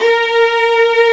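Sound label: Arco Violin A#3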